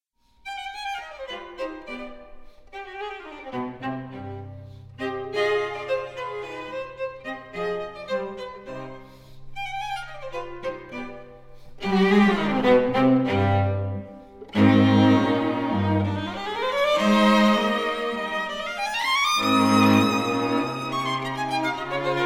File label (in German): Streichtrio c Moll Finale Presto; Beethoven; Op9-3